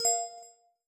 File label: chat message inbound; TeamSpeak 3